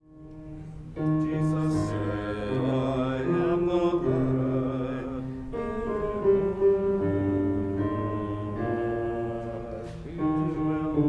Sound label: I Received the Living God bass